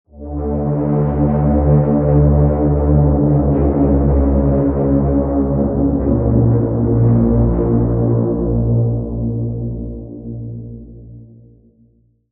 Pad - Degradation; Routed Sound; Vital Expansion - Quantum Enforcer Vol.1

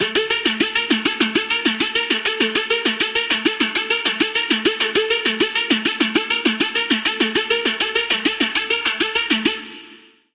Pluck; Routed Sound; Spire Expansion - Doom Carpenter Vol.1

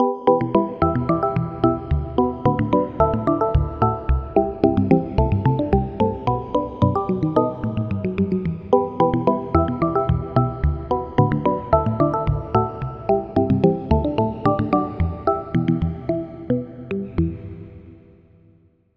Pluck, Sylenth1; Routed Sound; Sylenth1 Expansion - Story Walker Vol.1

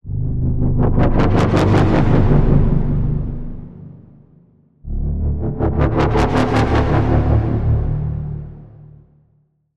Magnetic Resonance; Routed Sound; Vital Expansion - Doom Carpenter Vol.1

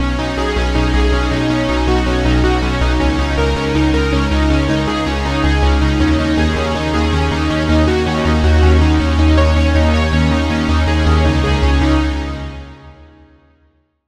Key; Routed Sound; Sylenth1 Expansion - Reality Maker Vol.1

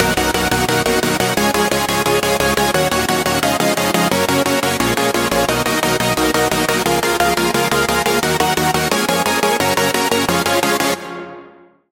Chord; Routed Sound; Sylenth1 Expansion - Flame Summoner Vol.1

